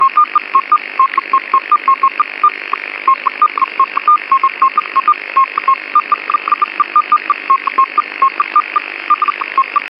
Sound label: roger chirp from a radio